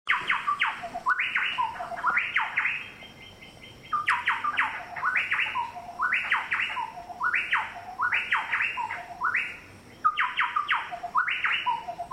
Orthonyx Spaldingii; Chowchilla; Family - Orthonychidae (Logrunners, Chowchilla); Call

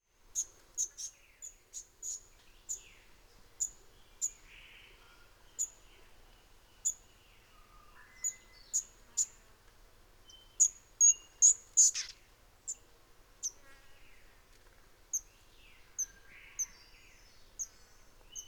Eopsaltria Capito Nana; Northern Pale-Yellow Robin; Call

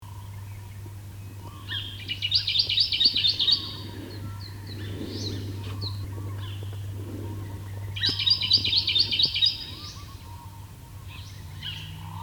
Microeca Fascinans, bird species; Jacky Winter; Call 2

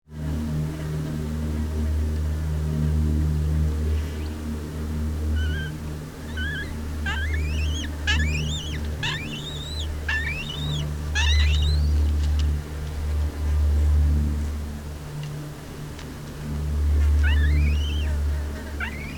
Hamirostra Melanosternon; Black-Breasted Buzzard; Call; Call 2

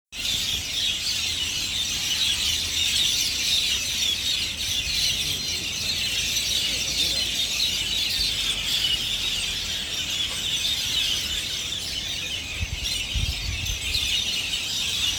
Aplonis Metallica, bird species; Metallic Starling; Sturnidae (Starlings and Allies); Call 2

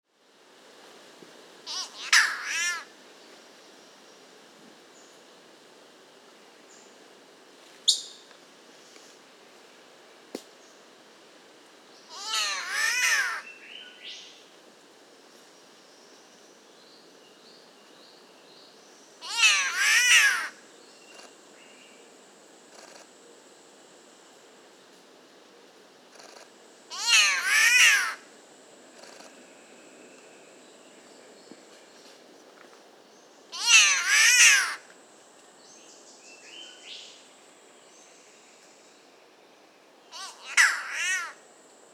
Ailuroedus Maculosus, bird species; Spotted Catbird; Ptilonorhynchidae (Bowerbirds and Catbirds); Call 2

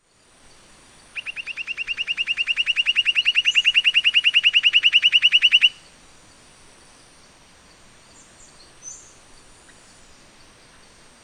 Arses Kaupi; Pied Monarch; Family - Monarchidae (Monarch Flycatchers); Call; Call 2

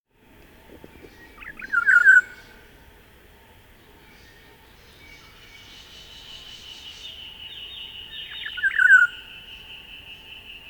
Oriolus Sagittatus; Olive-Backed Oriole

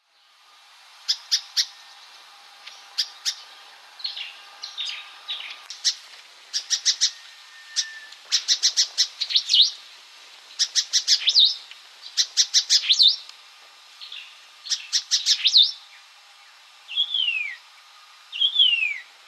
Carterornis Leucotis; White-Eared Monarch; Call

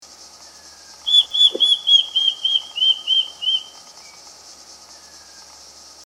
Lophoictinia Isura; Square-Tailed Kite; Call; Call 2

